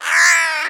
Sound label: penguin; tusk